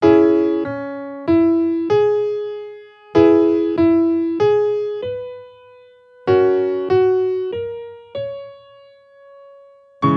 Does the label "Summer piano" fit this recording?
Yes